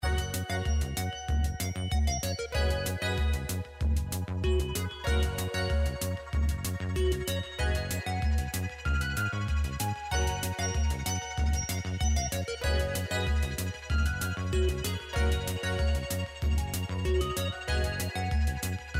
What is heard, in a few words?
free spin; slots; Gem Fortunes